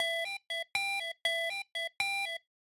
remote control; Robot Boogie, Connor's remote control

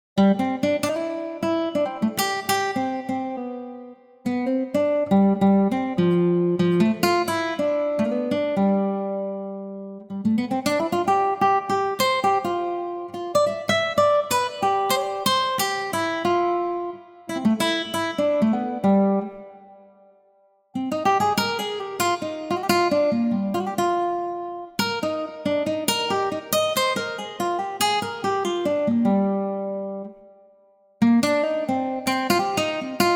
guitar improvisation

